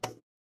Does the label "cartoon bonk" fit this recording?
Yes